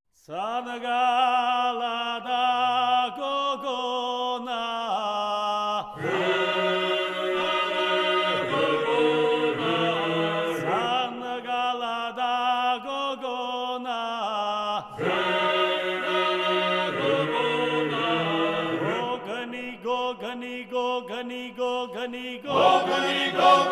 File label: Folk Choir; gruzia